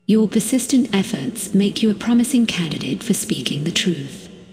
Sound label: efforts; REM-Freelancer; REM-Mod; Rebus; TheRebus